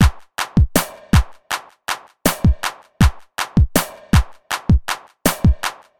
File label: drum 909